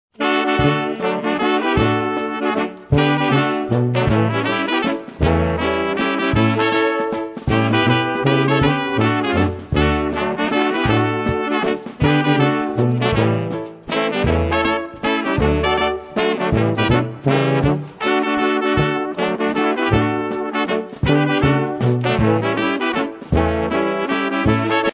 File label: waltz